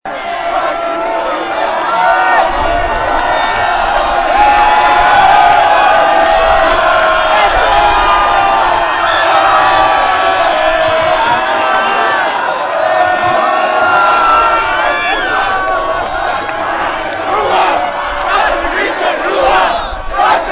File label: Pit Cheering